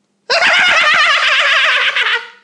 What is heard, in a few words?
hahahaha